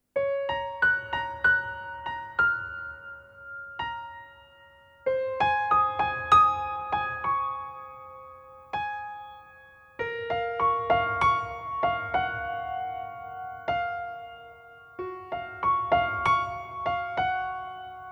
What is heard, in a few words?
piano melancholy; Muzyka Ilustracyjna